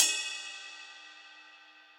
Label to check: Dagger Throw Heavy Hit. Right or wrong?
Wrong